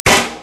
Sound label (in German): fenster zu